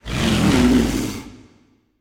death roar, manticore; smite

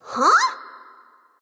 nv confused; cupid

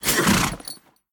deactivate; smite; lancelot

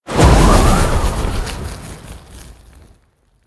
Winds; Smite; Susano

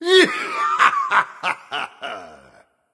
laugh; smite; zeus

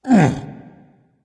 grunt lift dragon; fafnir

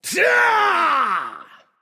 battlecry; danzaburou

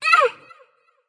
grunt hit light; smite; voicepack; hel

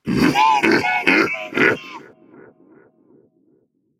clear throat; smite; jorm

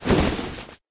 footstep cape; smite; Apollo

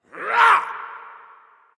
grunt; Smite; bacchus

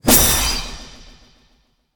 slash; smite; daji

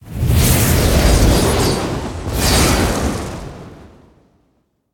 combo; smite; god arthur

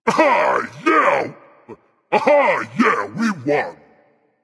yay berserker; cuchulainn

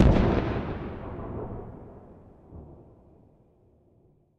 landing gun explo; smite; Ares

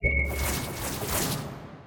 lobby victory stars; smite; god atlas

